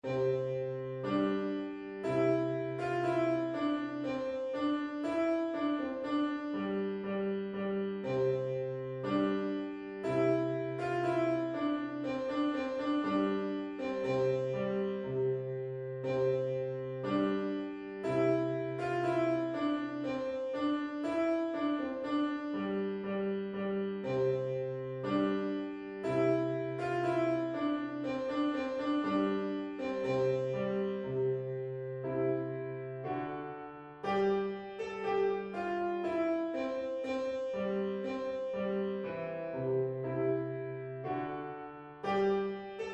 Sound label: trumpet voluntary; clarke jeremiah